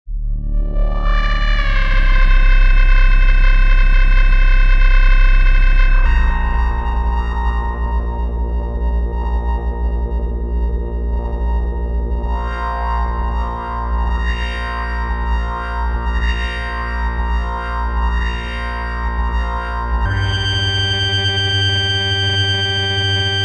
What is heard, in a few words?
Motion Church Pipes